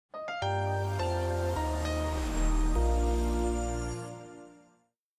Weather Sting; Sitting Duck Music; Good Morning Britain

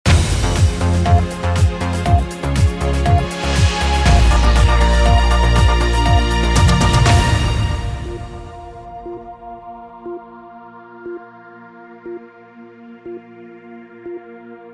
BBC English Regions Titles With Hold

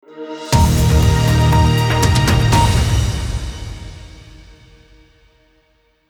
BBC News Channel Network Ident without hold

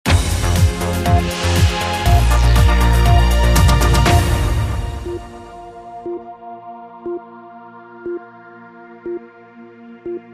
BBC English Regions Short Titles With Hold

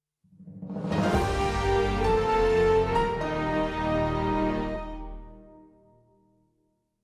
Ident; Sitting Duck Music; Good Morning Britain

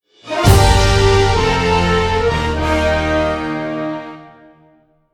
Sting; Sitting Duck Music; Good Morning Britain

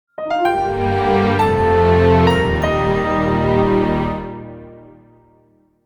Ident; Sitting Duck; Good Morning Britain

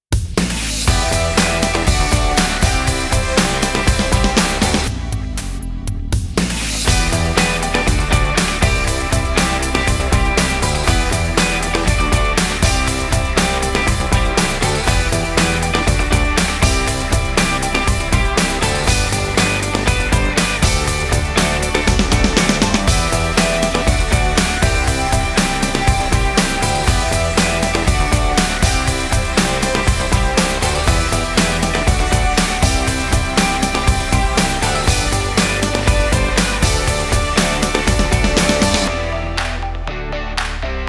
Sting into Bed; Sitting Duck Music; Good Morning Britain

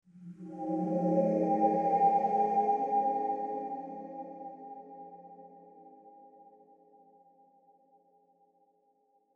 Question Clock Move Glint

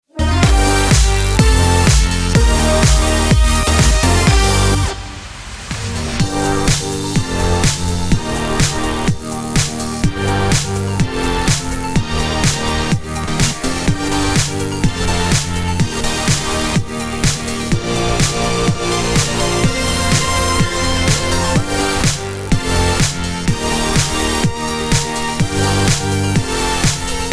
Entertainment Sting into Bed; Sitting Duck Music; Good Morning Britain

